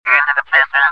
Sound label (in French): Robot